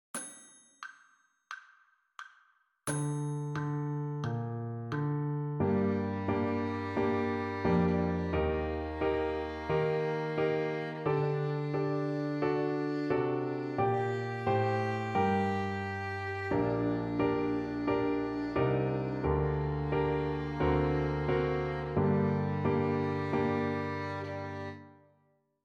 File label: G minor arpeggio